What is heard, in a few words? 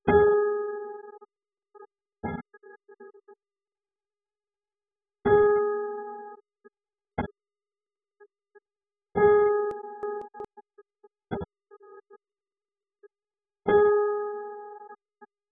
piano 1 layer